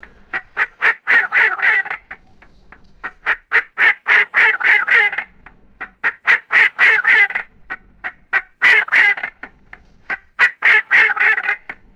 rhythmic turkey hunting call clucks; birds

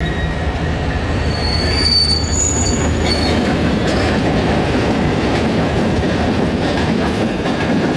high pitched freight train squeal and clank

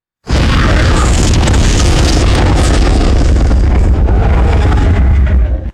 wet crack into deep monstrous roar; animals

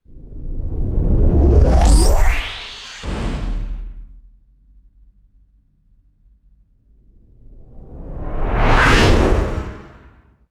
retro anime magical synth accents; cartoon

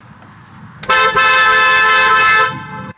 sharp car horn honk with echo

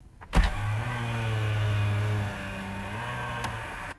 buzzy electric car side mirror opening; vehicles

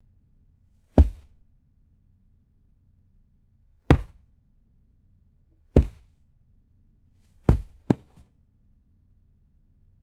hard soled shoe dropping on carpet; foley

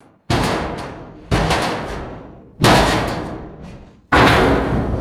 hard sheet metal clunks and rattles

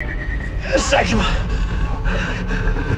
metallic impact with heavy human breathing; designed

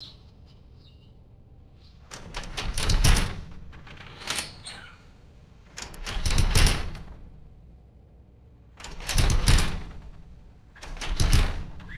metallic screen door opening and closing

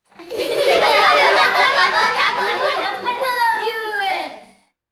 schoolchildren giggling in a classroom

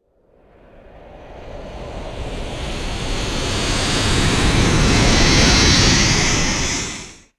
rushing airy wind whoosh pass by

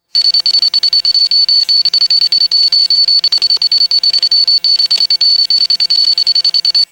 rapid high pitched electronic beeping

